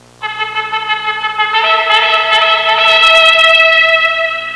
trumpet; Sound Library 2000; Wayzata Technology; Music In

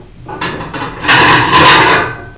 CLATTER

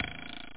Twang

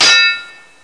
SW MET2; thief

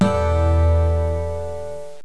Incoming Msg (PowerPC Startup Sound); Gerry's ICQ d44 FAT

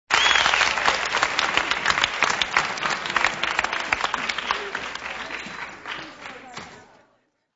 applause

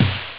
low, drums snare; OctaMED SoundStudio V1

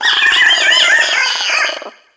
eel die; DAoC

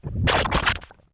impact; Far Gate; ENGLISH

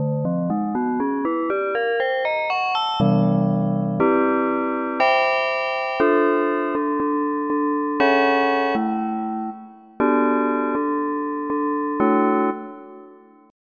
Guitar 2; Onj's Soundfont

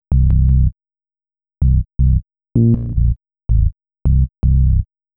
SNTH BASS AMBNT 160 C; Ambient

